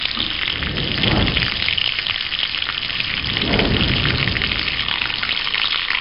SFX SIZZLE

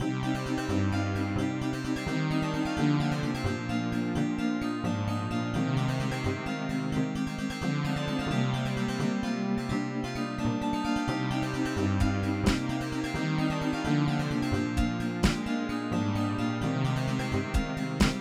Music Pirate; Dreamball

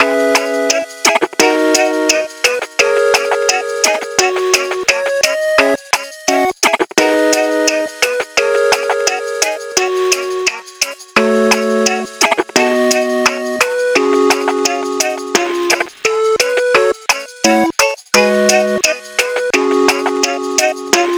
Overture Arp

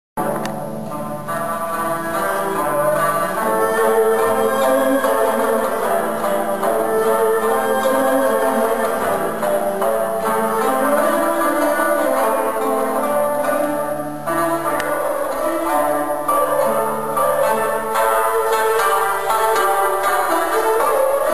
Music of Imperial China

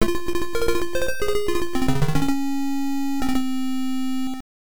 Start BGM; Gulkave; msx

